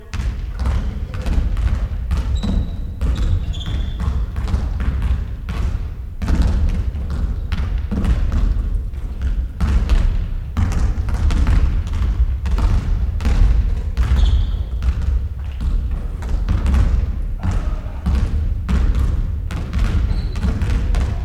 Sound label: basketball training